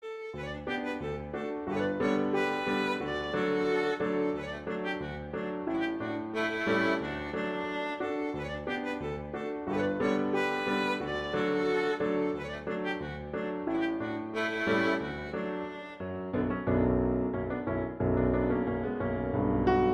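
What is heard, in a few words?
Allegretto, violin; Brahms; Hungarian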